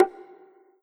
Yamaha TG100 High Bongo

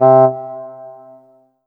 Korg M3R Bassoon C3

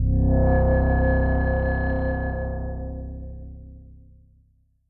Ensoniq SQ-80 Rezo Bell Pad

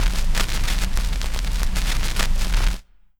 Ensoniq ZR 76 Record Noiz

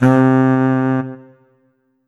Kawai K5000W Tenor Sax C3

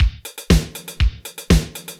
Roland D-20 Disco 120 bpm

